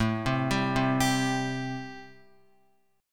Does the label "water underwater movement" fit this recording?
No